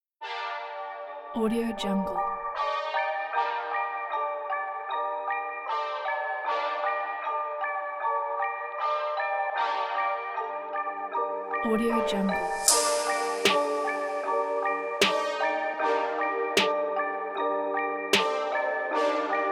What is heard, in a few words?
depressed sad trap hip hop